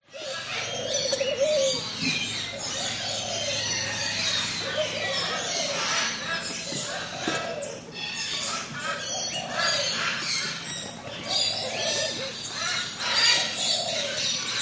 animals birds tropical large avery parrots parakeets doves and others distant; zapsplat